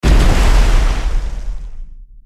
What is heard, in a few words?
Footstep Water; Sfx Godzilla Footstep Water1; Godzilla Smash3